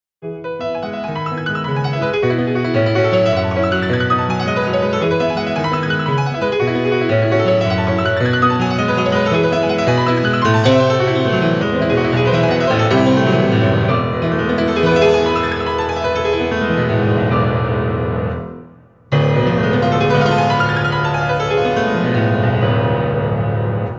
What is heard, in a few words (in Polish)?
Fortepian